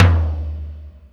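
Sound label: Lo Clr Wet, Alesis D4 drum machine tom; 300 Drum Machines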